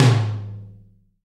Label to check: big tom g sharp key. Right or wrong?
Right